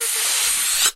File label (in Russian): vozdushnii shar vzriv odinochnii blizkii s vozduhom